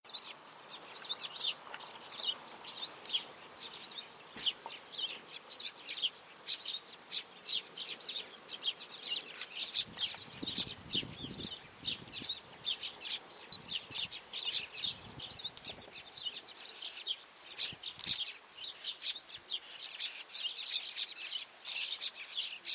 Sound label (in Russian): Голоса птиц — Красноголовая амадина Amadina erythrocephala Red headed Finch